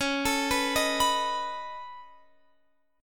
Db 7th Suspended 2nd Sharp 5th Db down Guitar Half Step Down